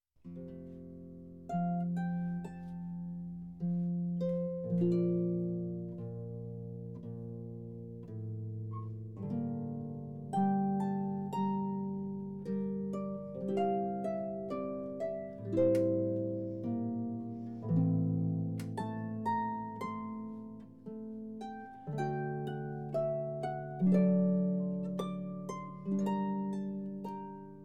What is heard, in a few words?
harp